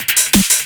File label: beat loop; Happy Tekno Track Contest SamplePack